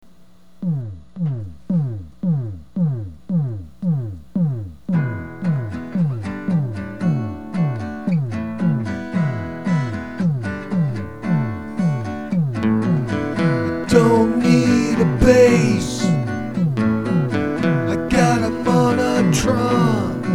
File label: monotron; from outer space